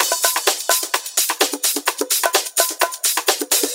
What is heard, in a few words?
Latin House Loop 128 BPM; Vengeance Samples